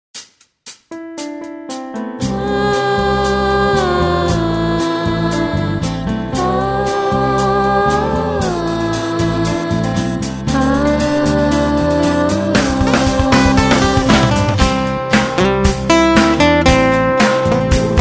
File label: Guitar Instrumental